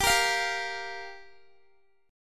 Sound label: G Minor 7th, Guitar; Gm7; strummed; x,22,20,22,19,x